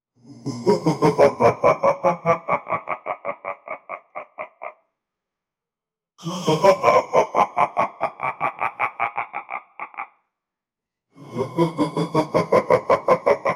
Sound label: evil laugh